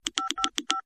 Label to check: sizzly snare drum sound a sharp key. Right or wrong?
Wrong